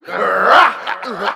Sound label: growl, Voice